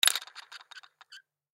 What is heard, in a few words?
Baby Toy Spin Ball; Miscellaneous Toy; Foley; Blastwave